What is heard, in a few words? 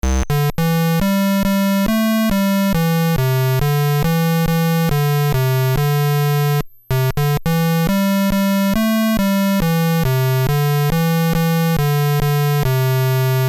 Oh Susanna; Music Composer; WaveMakers